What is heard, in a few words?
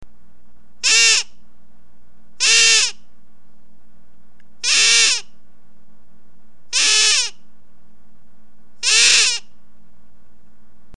duck call